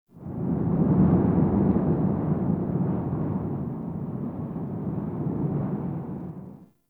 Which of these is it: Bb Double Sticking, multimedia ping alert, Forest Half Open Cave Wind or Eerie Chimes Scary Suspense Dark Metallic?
Forest Half Open Cave Wind